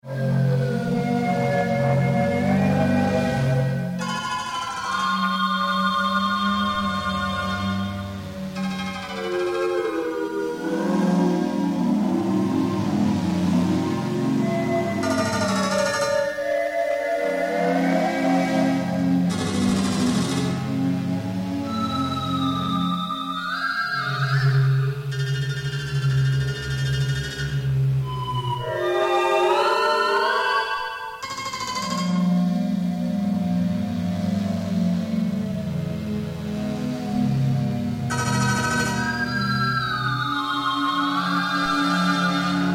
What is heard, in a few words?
melodiya; muzykalnaya skazka